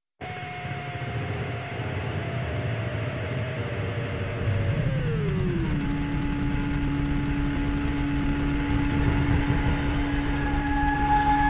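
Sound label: no percussion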